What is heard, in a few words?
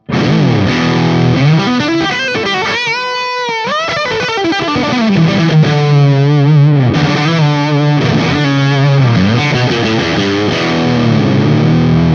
lead